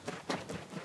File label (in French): Aigle Floppement D Aile